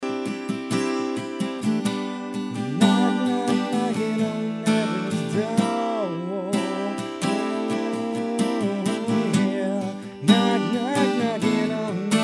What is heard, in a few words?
accGTR; M-RE-20; Mid / Side